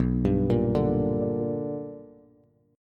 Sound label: B Minor Major 7th Sharp 5th Bass; arpeggiated; JGuitar